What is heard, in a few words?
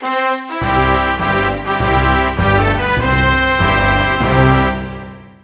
Mexico, Fanfare; 1997 MMX LAN PACK (win 95); Worms Armageddon